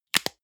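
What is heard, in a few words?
deodorant can lid close hard, badezimmer; haus-garten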